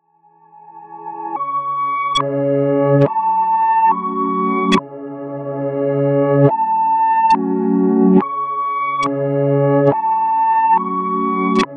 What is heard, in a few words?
Drill